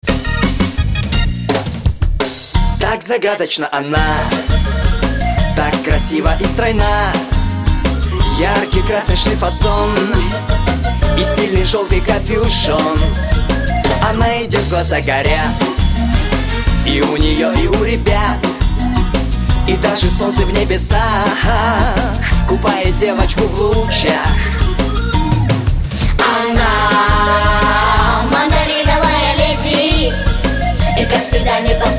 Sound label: song